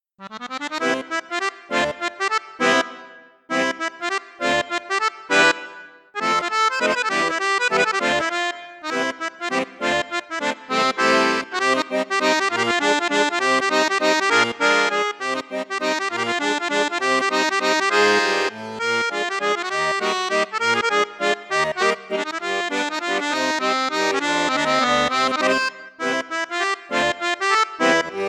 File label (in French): registre flute juste, accordeon; cavagnolo vedette